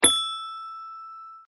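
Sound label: xylophone; Toy Story 3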